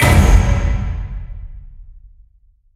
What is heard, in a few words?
impact metal big; Smite; Cabrakan